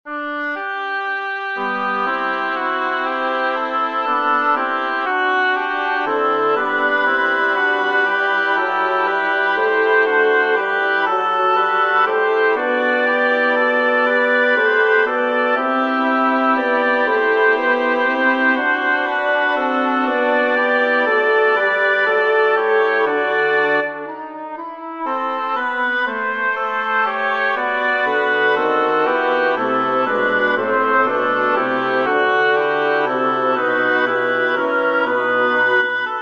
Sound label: psalm for double reed quartet; kiel friedrich; wie lieblich sind deine wohnungen